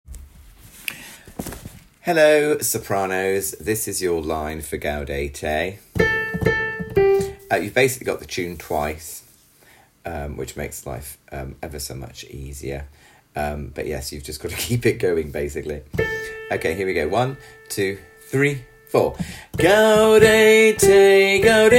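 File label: Gaudete SOPRANO; Sops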